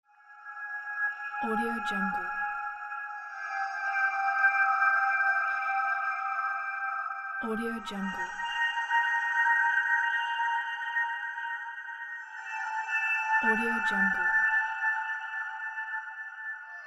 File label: cinematic space ambiance